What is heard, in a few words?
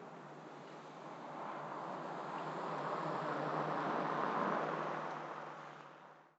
office outside driveby, ambient atmosphere